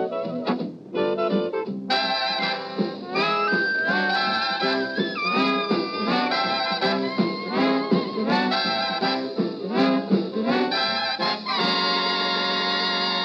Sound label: wah wah